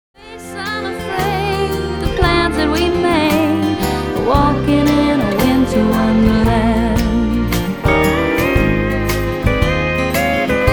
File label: Electric Guitar Pop